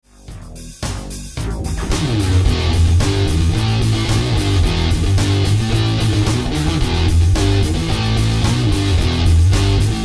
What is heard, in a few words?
muff